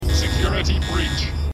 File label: computer security breach